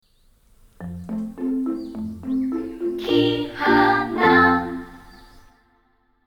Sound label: Sound logo; Kihana